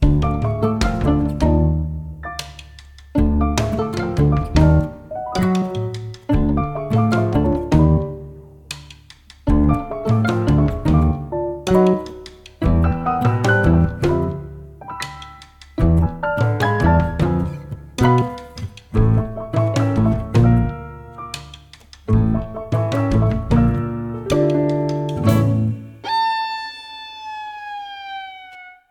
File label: Basic Piano; King Oddball; ps vita gamerip 2014